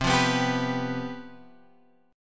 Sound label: C Major 7th Suspended 4th, down, Guitar, Open G